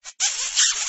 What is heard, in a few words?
smatch